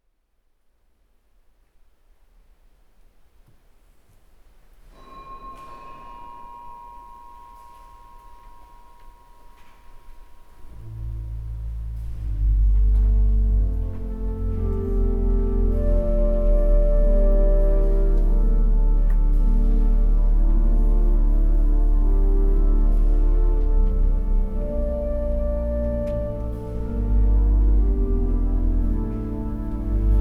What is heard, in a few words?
ORGAN VOLUNTARY, cathedral; Wells; Wells cathedral May 2022